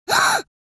Little girl surprised sigh

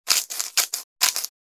Salt grinder twist crunch; cooking

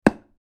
Dartboard impact dart hit; Darts

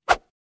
Kung fu bamboo stick whoosh

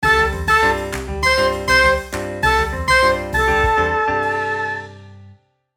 Cheerful upbeat melody sitcom music

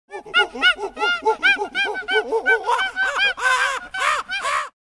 Apes chimpanzees calling out

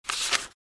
Softcover book page turn

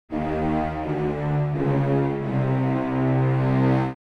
Carton orchestral fail

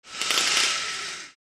Horizontal blind lower down

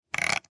Old mouse roller

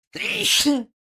Old man sneezing

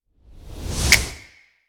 Cinematic rise and snap; logo animation

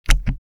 Radio volume control knob press

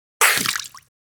Man spitting spit water

